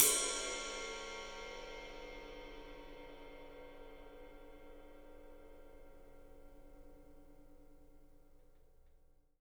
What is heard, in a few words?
PW RIDE, RIDE CYMBAL, 3D Drums; 3D Sound